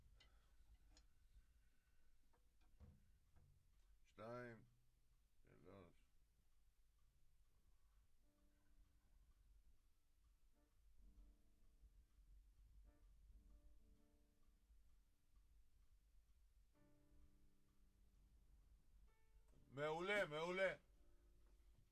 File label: Drums